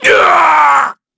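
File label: Damage, Korean; Shakmeh; kings raid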